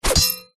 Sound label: warfare weapon knife throw hit body against wood pin stab hit; zapsplat